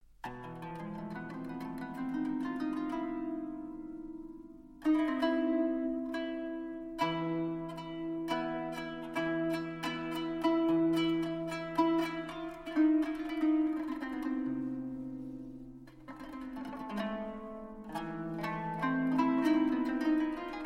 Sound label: Taksim (Kanun)